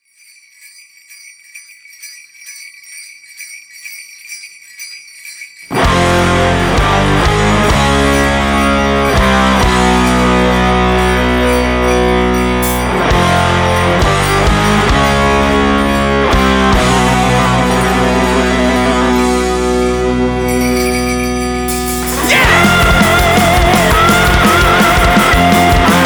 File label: Jingle Bells